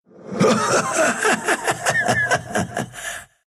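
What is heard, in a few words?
laugh, Russian; dota2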